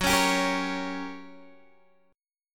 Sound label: G Minor Major 7th Flat 5th, F sharp